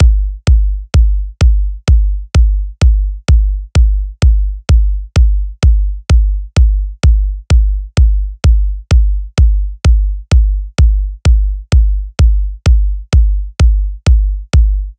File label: bass drum, Key C#, Bpm 128, Big Room House; Loop Nerds; Big Room House Allstarz